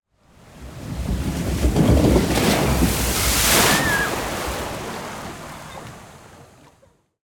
pass by fun ride; sonniss gdc

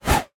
whoosh sound design cartoon whir; sonniss gdc

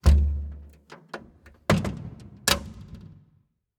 door basement; sonniss gdc

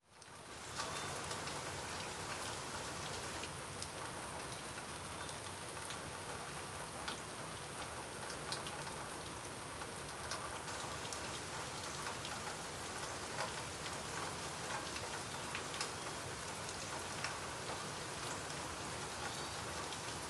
rain light; Sonniss GDC

